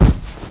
icewind die

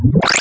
attack; argos

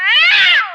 nine tail die

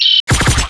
SEAWIND attack

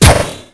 rebound shield

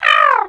sropho damage

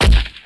fake angel attack

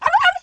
grey wolf baby die

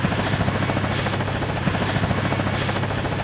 propeller large

